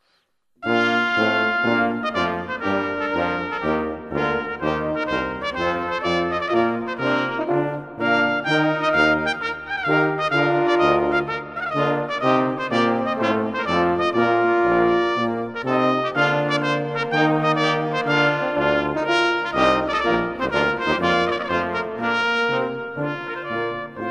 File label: Trumpet tune in D